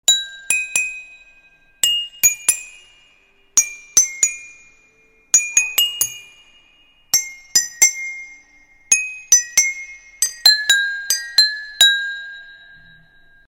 love bgm with cup music; gilli

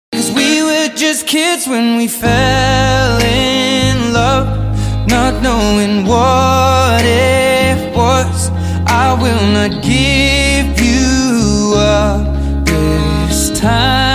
perfect ringtone

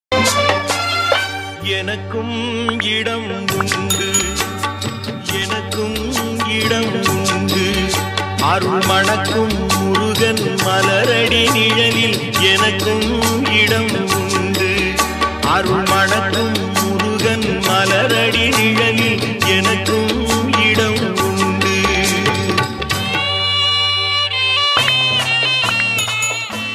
devotional song ringtone song; Lord Murugan song

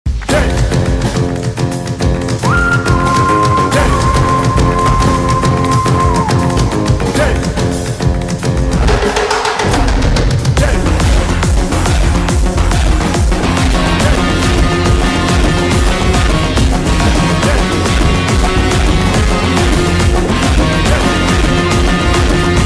climax bgm ringtone, telugu bgms; star boy siddu

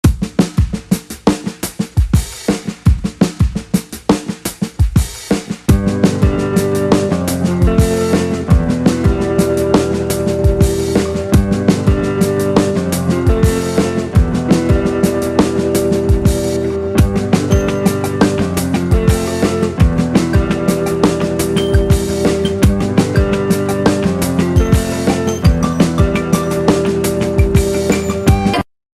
trending bgm ringtone

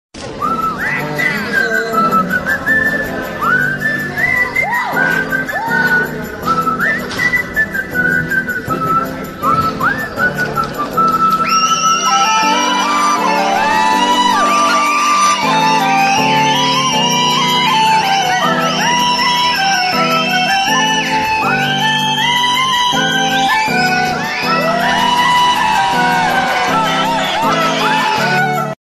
theme music; deivathirumagal

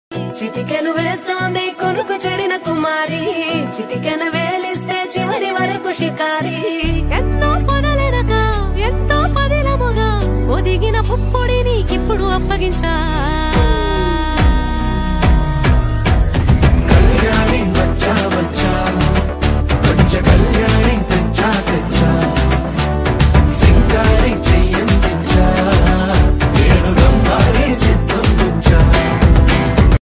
tamil ringtone

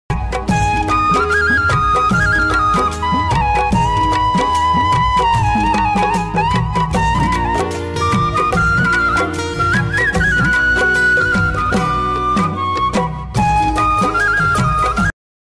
flute song instrumental

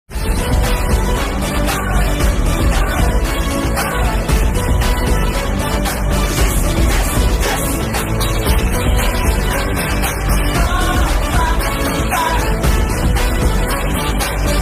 ringtone bgm music; kamal hasan new movie

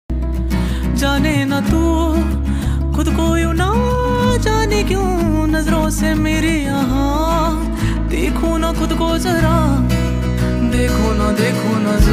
sad song; aesthetic

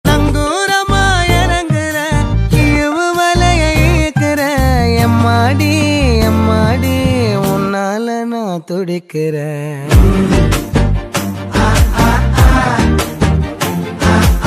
nangurama irikire song ringtone

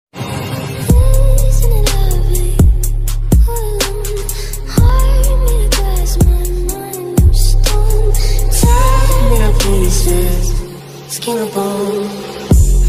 new punjabi ringtone

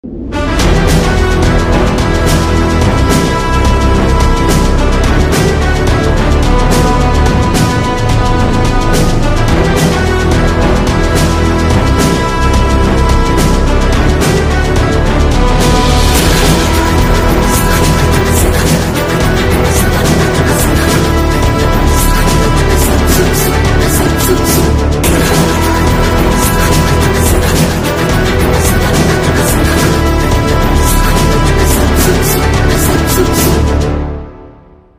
interval bgm

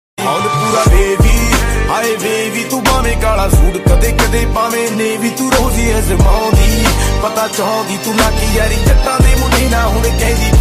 remix ringtone love song

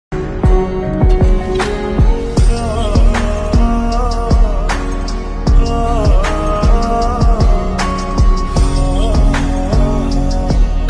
nepali slowed reverb bgm ringtone

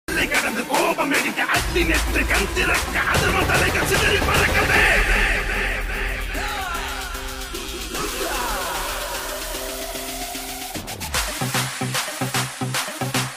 bgm ringtone; rudhran; rudra

